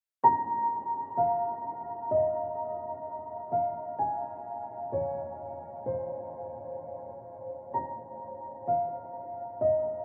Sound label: Ethereal Space Piano Notes